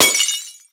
Glass Jar Break

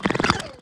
creature emote; Gonner

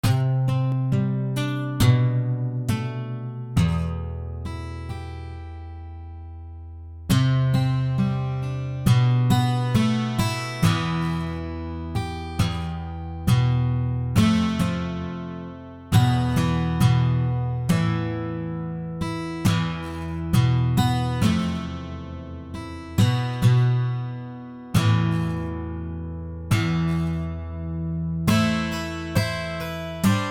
Guitar; Next Day Survival

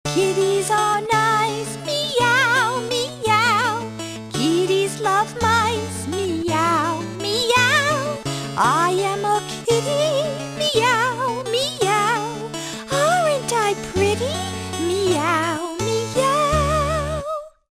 Cat Song

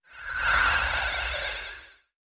robot death; enemydeath; gonner linux macos ps4 switch windows xbox one gamerip 2016

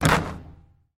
Score 3-Pointer

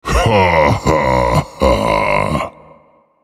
Vocal Laughs; Minecraft Story Mode; Colossus

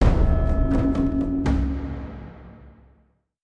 Caves Drums Ending; Ninjago Monastery; lego universe macos windows gamerip 2010

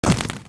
Step hot; Fight Knight

